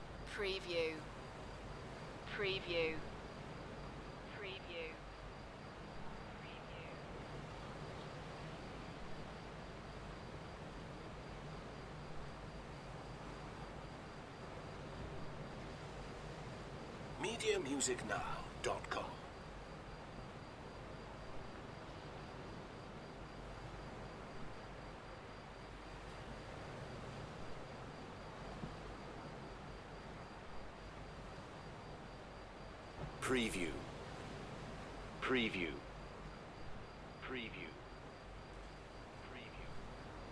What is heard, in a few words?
Promenade by night with insect noise; beanstalk audio